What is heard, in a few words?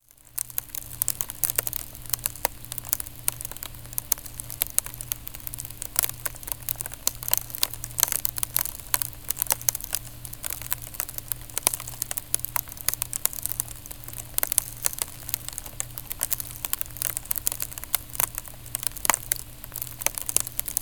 sleet; Sleet at midnight; 16 Feb 2015, 30sec, stereo